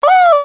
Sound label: chicken scream